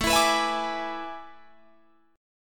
A Major 7th Suspended 2nd Suspended 4th, Guitar, Drop D